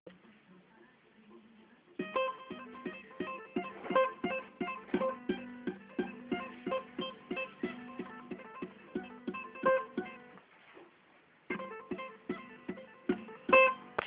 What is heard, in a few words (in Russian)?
Играем на гитаре